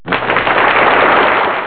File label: Applause; Wacky Wheel!